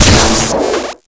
defib hit; tf2classic

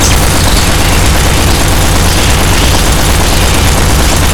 machinegun shoot crit, weapons; tf2classic

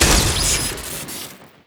furious wind shoot crit, weapons; tf2classic

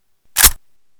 tommy clip in, weapons; tf2classic

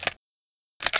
derringer world reload; tf2classic

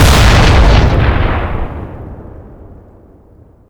explosive charge explode; tf2classic

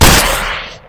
gyrojet shoot; tf2classic

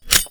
Beatophone Shut Off, weapons; tf2classic

